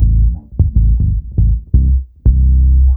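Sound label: A minor, Bass, 76 BPM; Ultra Freakin' Bass; Sampleheads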